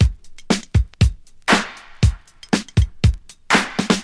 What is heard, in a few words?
disco breakdown, funky drum loops